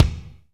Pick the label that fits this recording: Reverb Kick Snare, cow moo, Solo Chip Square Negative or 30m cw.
Reverb Kick Snare